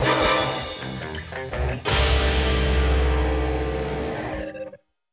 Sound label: Action END